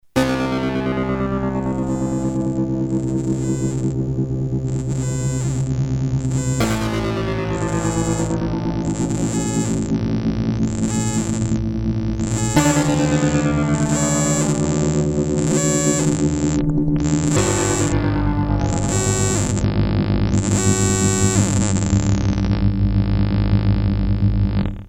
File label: raw osc ringmodulation; Red Sound Darkstar